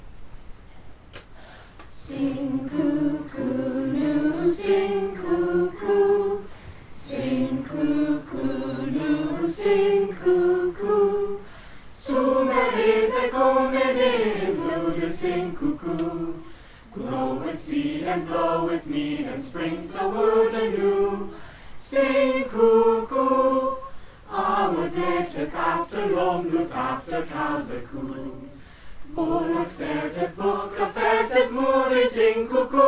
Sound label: Chorus; Sumer is icumen in; Anonymous 13th c.; "Music with a View"